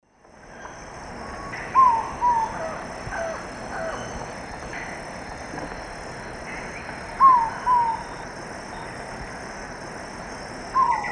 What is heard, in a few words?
Thamnophilus praecox, call female; S. America; Eastern Lowlands